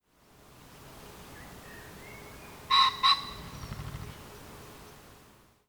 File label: Common Pheasant Phasianus colchicus